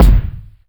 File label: small reverb kick drum single hit b key, kick sound; tuned drums